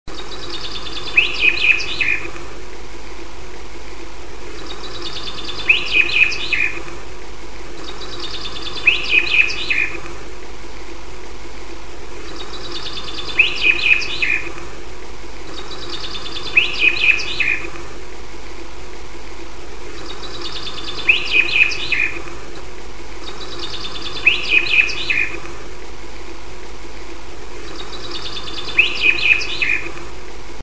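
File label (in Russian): zjablik, common chaffinch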